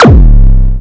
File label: Puretone Sq, Kick Drums; Zero-G; Soundclash